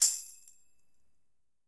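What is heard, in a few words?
Tamborim C Shake; Latin Percussion; Drums; Drum Hits; Tambourin; Ableton; Factory Packs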